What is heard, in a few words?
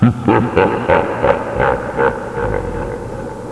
haha